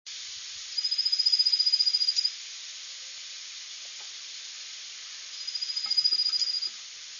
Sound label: junco; birdsongs